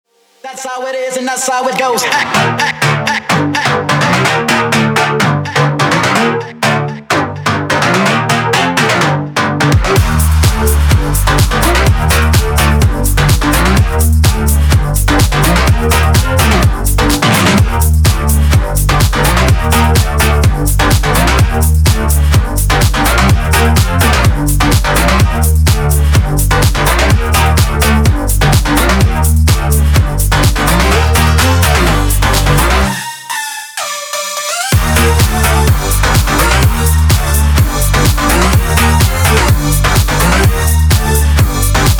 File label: Guitar Track